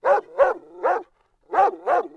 dog; Mafie 1

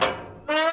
peep op; Mafie 1

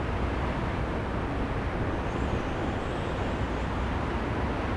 ambi town night; Mafie 1

